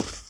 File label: Foot Woman Carpet